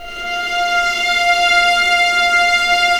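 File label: F3 legato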